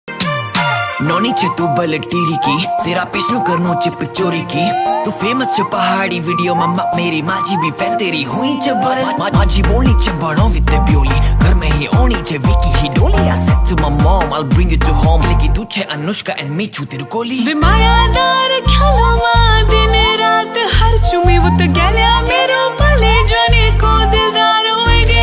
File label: uttrakhandi ringtone